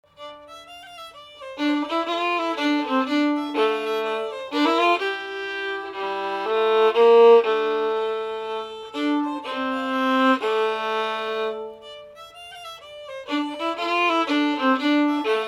carillons, nyckelharpa

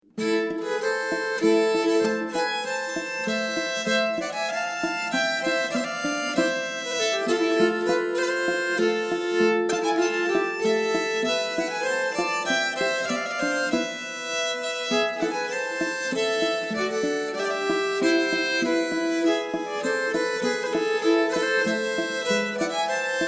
Funasdalspolska, nyckelharpa

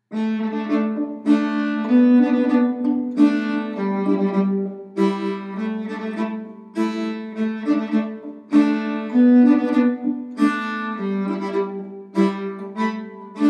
polska, nyckelharpa

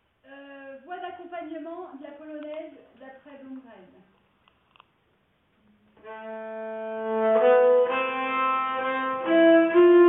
Polonas, nyckelharpa; Polonas Am Efter Blomgren

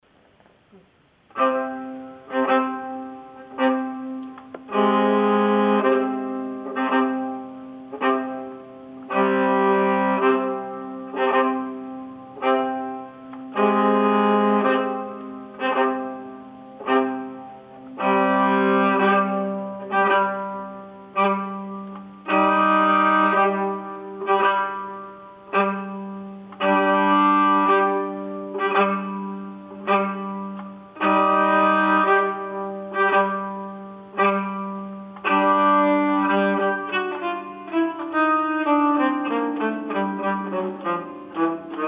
nyckelharpa

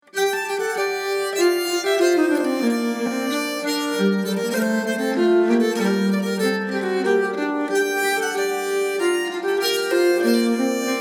Polska, nyckelharpa; efter Jernberg

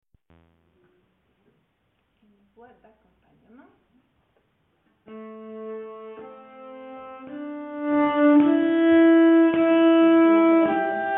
Schottis, nyckelharpa

